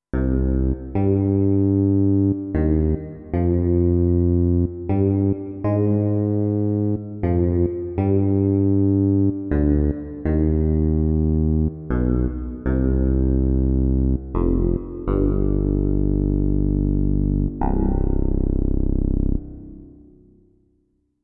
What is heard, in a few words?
Clavi Bass